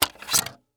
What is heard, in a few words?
Technology Electronic Machine CD Player Removing CD From CD Deck